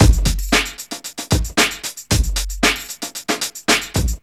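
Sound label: 114 bpm, Funky loops; Zero-G; Funky Elements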